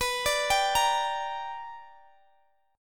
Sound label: B Minor Major 7th Sharp 5th B Guitar Drop D, chord